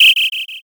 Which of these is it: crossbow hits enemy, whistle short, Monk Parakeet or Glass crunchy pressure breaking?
whistle short